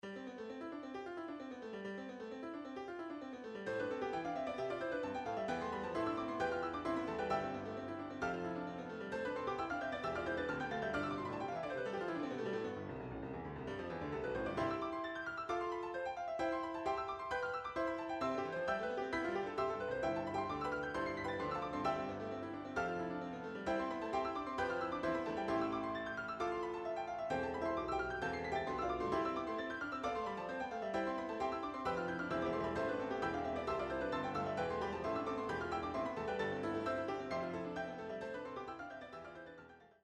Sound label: 4 Hand Piano